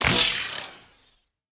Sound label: impact glitch; soundscrate